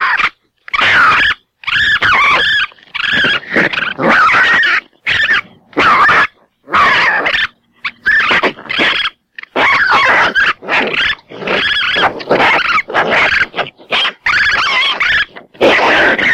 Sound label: Raccoon Fight